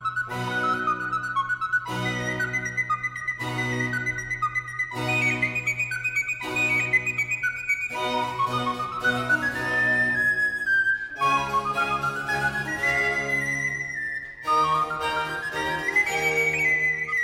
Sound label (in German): concerto c dur fuer sopraninoblockfloete streicher und basso continuo; allegro